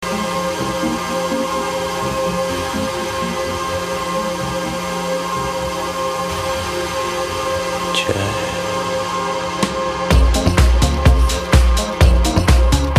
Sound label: jazz tech